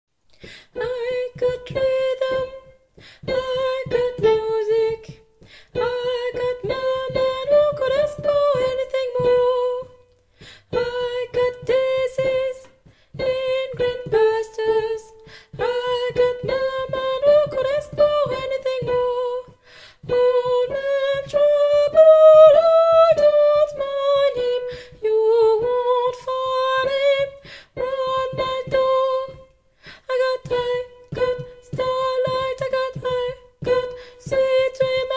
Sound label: tenor